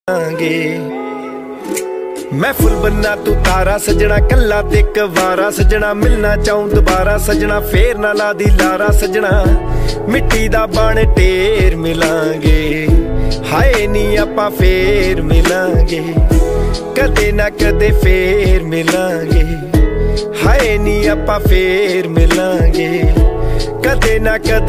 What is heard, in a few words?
romantic ringtone love song